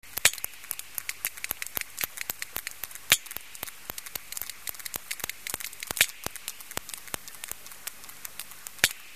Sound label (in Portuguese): cachalote